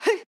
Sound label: Swipe; Jackie; Going Under